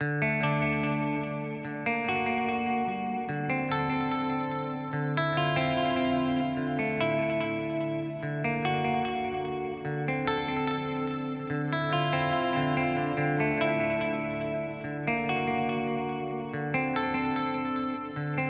Boss GT-1000 Core Delay Space Echo; Vergleichstest

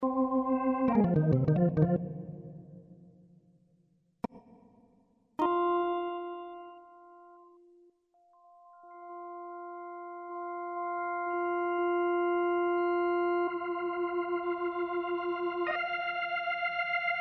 Roland VR09 Hammond

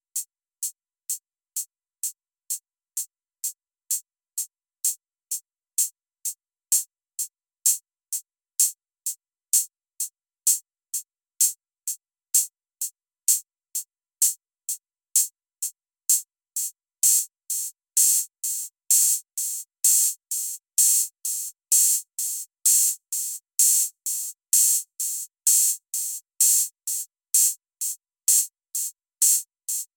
TipTop Audio HATS808 CH

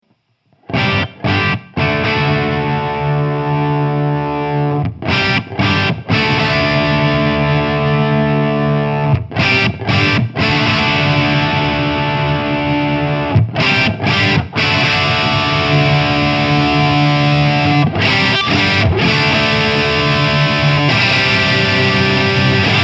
Plexitone Volume Drive; Carl Martin

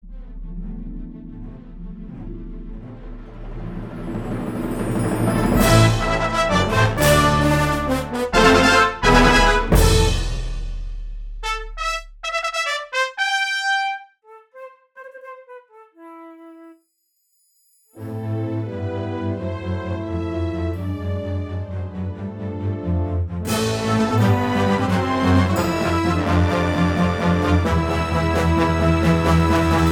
dry stereo; VSL MIRPro3D

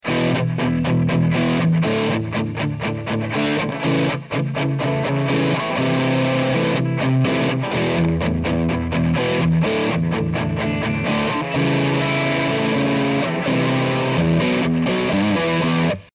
Dist Ch Gain; od250

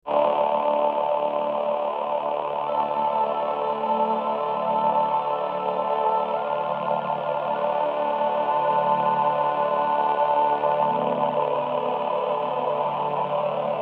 VP330 male choir

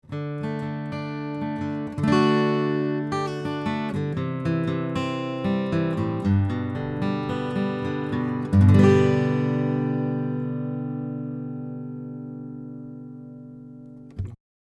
C 12 Acoustic Git Picking 25cm 15 Fret

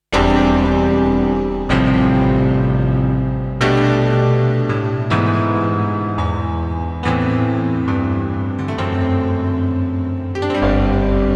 Piano freeze

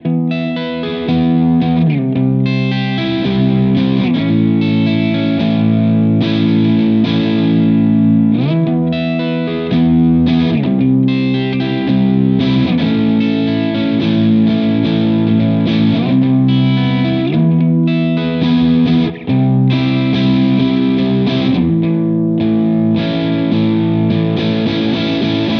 D'Angelico Bedford Deluxe Clean Humbucker

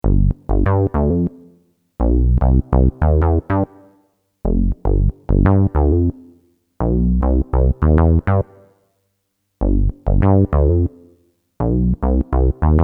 Bass

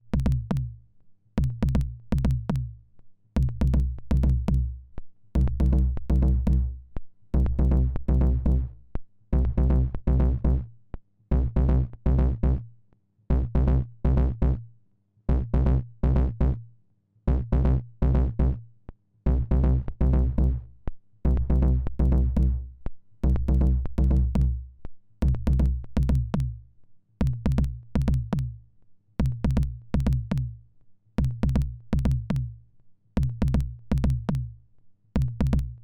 HI GAIN GAIN; Snazzy FX

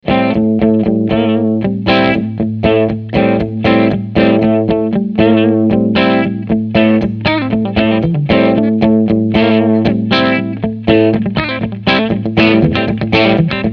Drive Channel Med Gain EQ

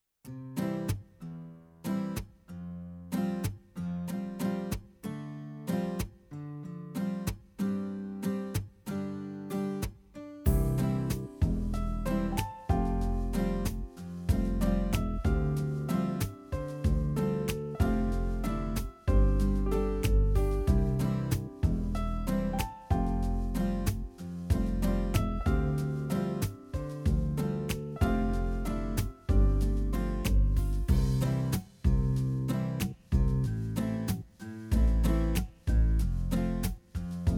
Pa300 Style Unplugged

